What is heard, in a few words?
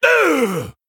Male Tuskarr Death